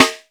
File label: R-8 piccolo, Dry